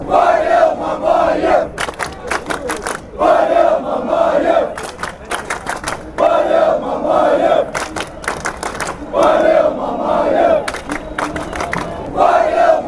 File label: cska chant